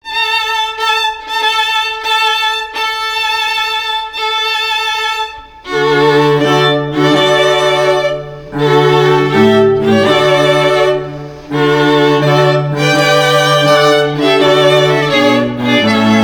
Classical, Classical String Quartet

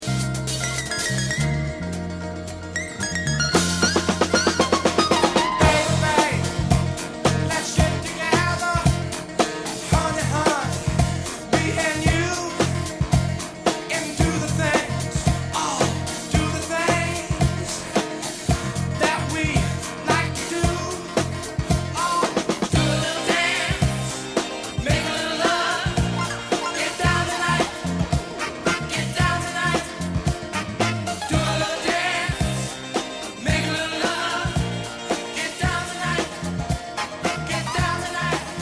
Funk

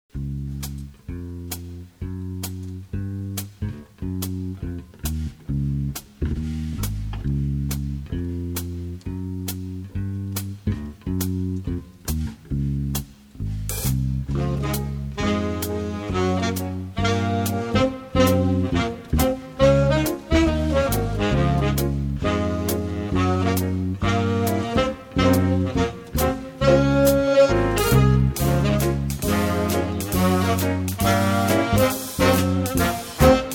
Big Band, Swing Band

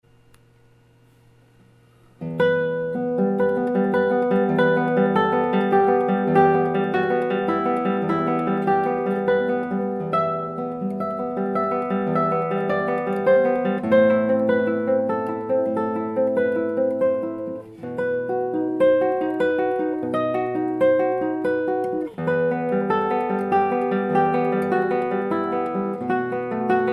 Spanish Romanza, classical guitar

